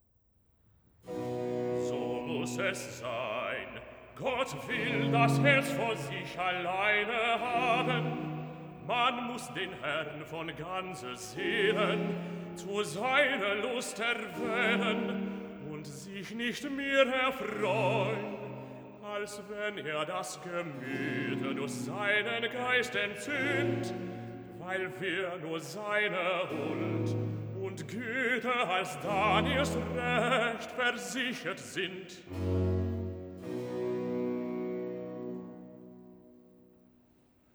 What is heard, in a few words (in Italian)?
Cantata, Recitativo (Bass); BWV 77 'Du sollt Gott, deinen Herren, lieben'; 'So muss es sein!'; Bach; Frankfurt; 13th Sun After Trinity